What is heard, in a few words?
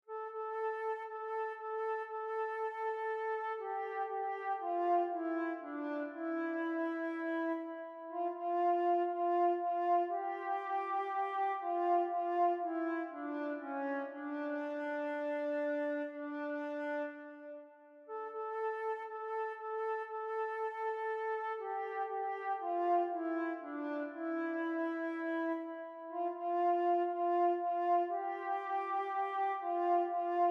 Karaoke; Hau Laumalie